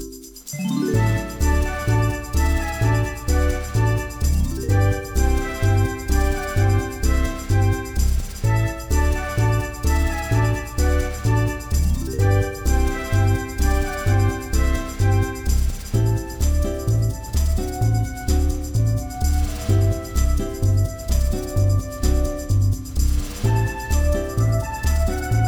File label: victory; fife-parpg; rio de hola